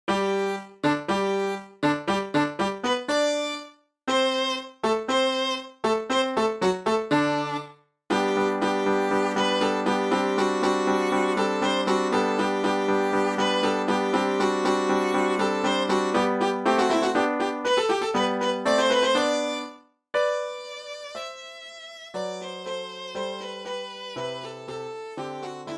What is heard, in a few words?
eine kleine nachtmusik viola